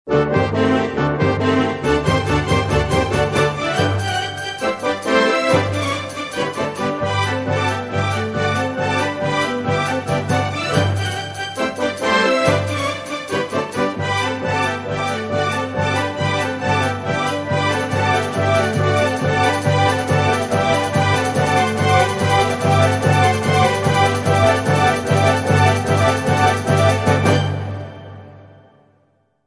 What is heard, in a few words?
Russian Dance